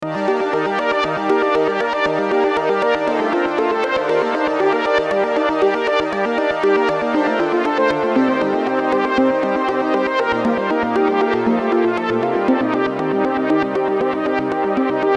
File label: Cycle JX3P